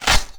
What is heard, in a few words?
Menu Click